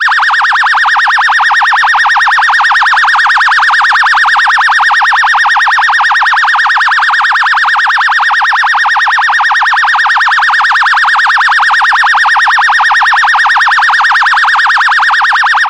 police phaser siren

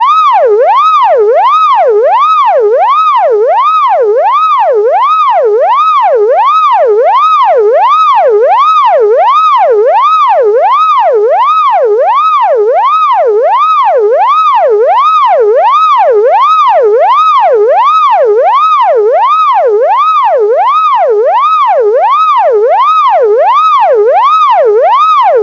electronic siren

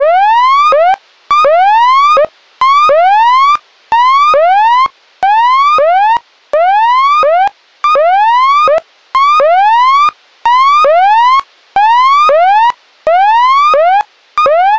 warning electronic siren

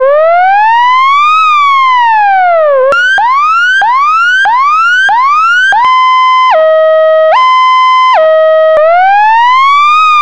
emergency electronic siren

